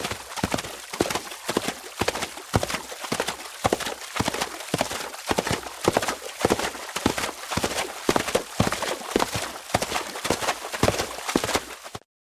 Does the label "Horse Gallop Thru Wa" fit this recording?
Yes